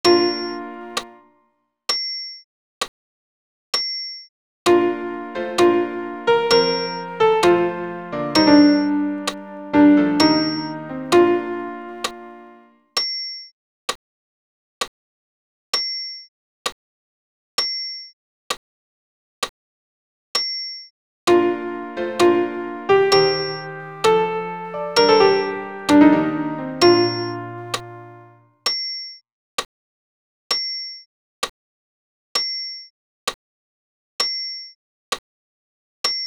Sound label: alt pian acc; Gloria